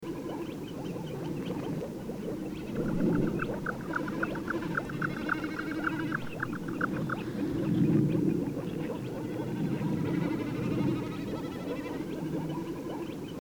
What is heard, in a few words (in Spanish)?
agachadiza chica